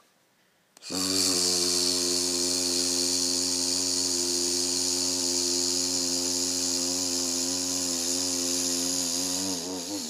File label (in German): Stimmübungen Summen